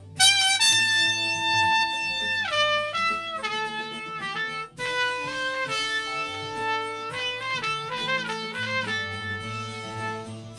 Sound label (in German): Doubler Trompete Slow Flange